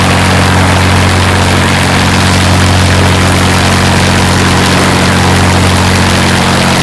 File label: spitfire side, engines; War Thunder